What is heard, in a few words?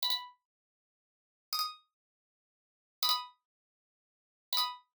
Digital Click Positive; boom library; The Interface